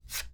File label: Take An Object Fast